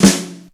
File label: JAZZ FLAM, snare drum; SNAREDRUMS